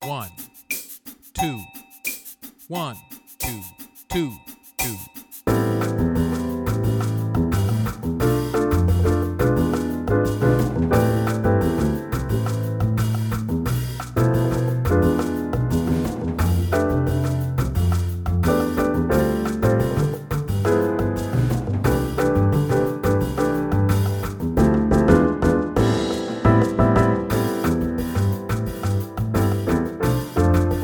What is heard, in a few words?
Trumpet Play a Long LATIN; Jazz-Band; All-State